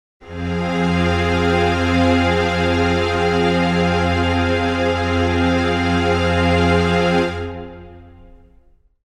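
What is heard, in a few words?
string chord f minor, Pads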